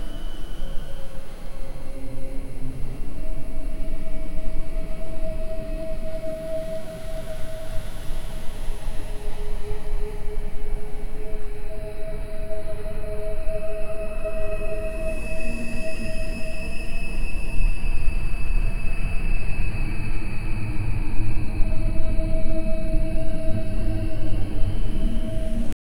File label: eerie ambient horror soun, underground